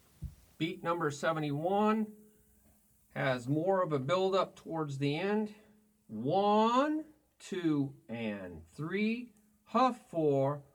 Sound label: Beat 1 2 3 a4 a Toom Ch Ba Ti BaCha Ba